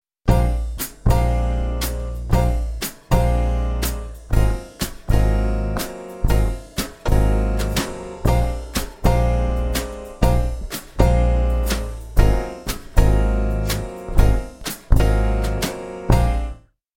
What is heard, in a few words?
jazz groove